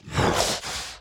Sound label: Enraged Breathing Loop; The Town Sam Clip Burns; Devour 2021 gamerip pc macos